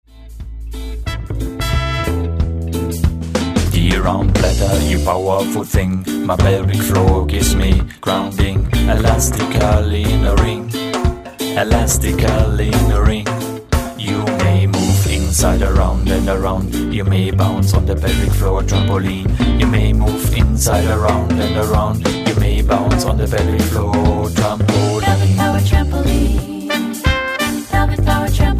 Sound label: ragga